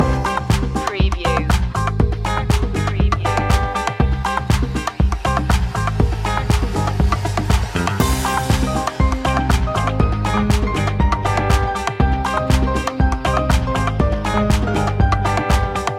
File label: latin dancing loop; media music now